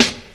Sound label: old school sizzle acoustic snare sound a sharp key